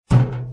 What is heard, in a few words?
barrel hit